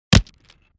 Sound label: BOW hits